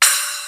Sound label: Percs